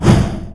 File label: hero dragon spit